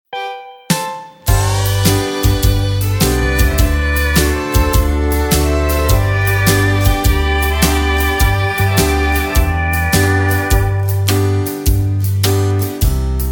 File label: (G) No Backing Vocals